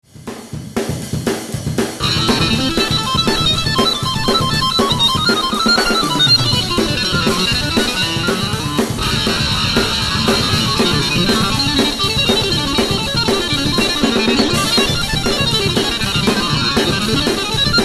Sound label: metal session